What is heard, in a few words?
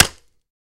Staff Wood Hitting